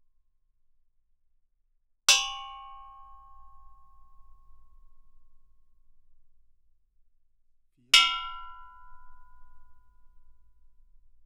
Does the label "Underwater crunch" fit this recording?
No